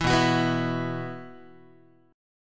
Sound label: B Suspended 4th, Guitar; Bsus4 strummed; JGuitar